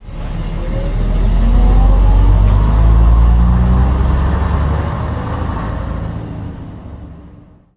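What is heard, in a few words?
daimler fleetline, bus; Simutrans; Pak128.Britain-Ex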